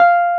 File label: Wurlitz EP F5; Sonic Reality - Vintage Timetraveler